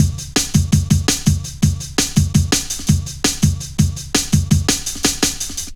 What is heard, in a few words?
Fat Rolla, Jungle Drum Loops 166.5